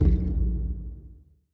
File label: spin loop end; rise of maya